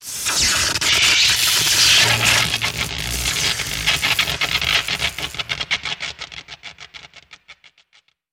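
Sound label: Electric Arc Lengthy, arcs & sparks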